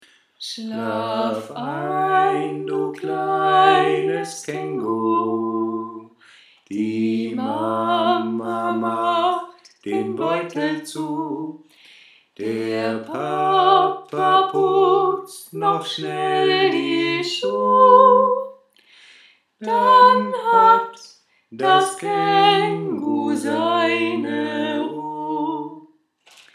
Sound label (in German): Schlaflied